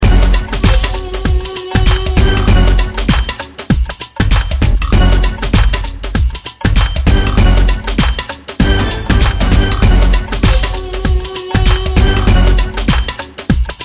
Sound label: Ethnic like